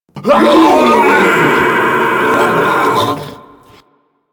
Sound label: Infested Jetpack Jump Attack; Warframe